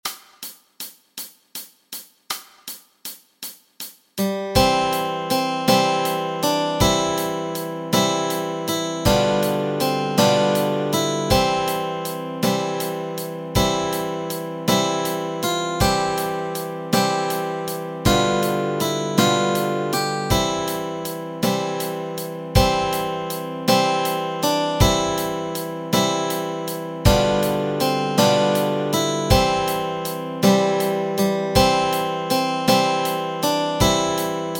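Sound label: Melody and Chords Track, Easy Guitar and Ukulele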